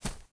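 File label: grass run right, footsteps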